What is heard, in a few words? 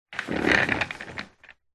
office chair wheels roll carpet short